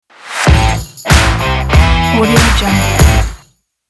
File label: guitar rock logo